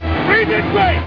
breathe

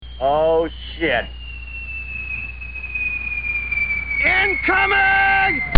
incoming

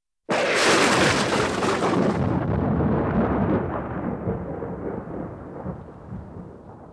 Lightning strike with thunder crash

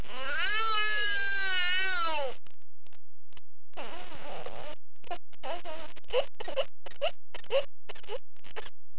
cry; Crying 3 mos.

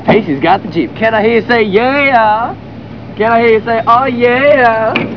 jeep

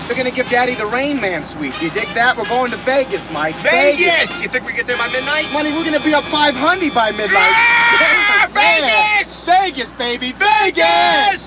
swing

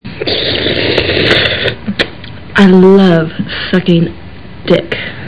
slurpie

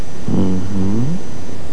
umhmm; Sexy "mmm-hmm"

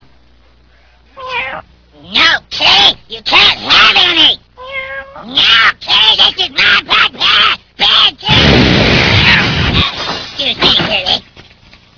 kitty

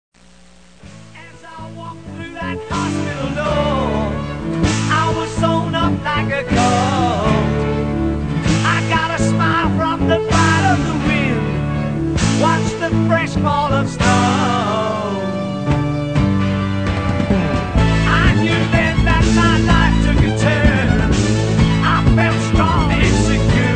disco

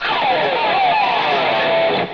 slide; Example 8 - Pick Sliding; sliding your pick down the bass strings of your guitar

